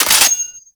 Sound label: Sniper Low Clip Mech; DE; Warframe; Frumentarius; Exalted Sniper